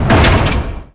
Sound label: mine catwalk end; doors elevators; Star Wars Obi-Wan; XBOX